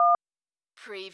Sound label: dialtone; Button / Multimedia Sounds